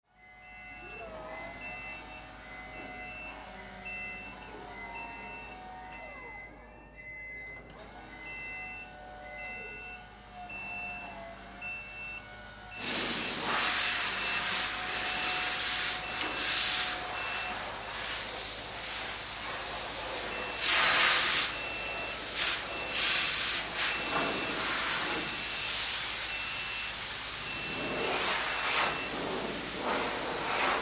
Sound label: Paint Room Spray Beeps Automobile Factory Ambience; Spraying; Industry; Blastwave